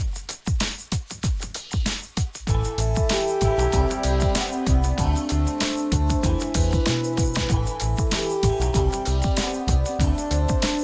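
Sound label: Walk Proud no acoustic guitar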